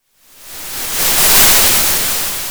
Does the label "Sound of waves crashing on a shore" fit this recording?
Yes